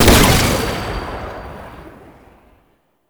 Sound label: Concrete Archgun Charged Shot; Warframe; Archwing